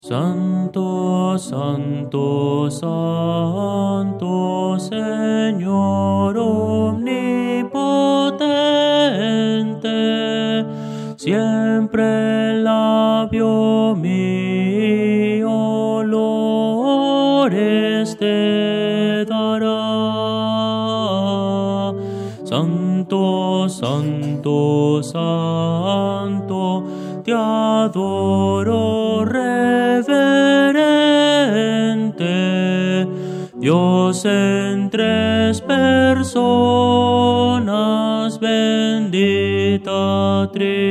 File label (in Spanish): tenor